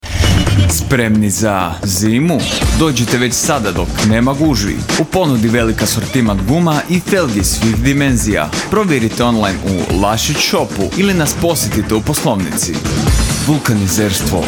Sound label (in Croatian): jingle; spremni na zimu